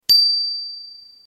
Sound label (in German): Fahrradklingel Clever VII